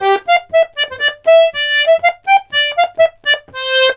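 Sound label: Accordions, polka; USB Soundscan vol.40; AKAI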